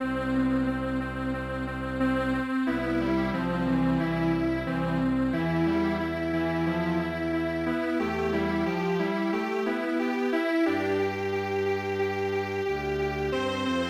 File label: Strings Quartet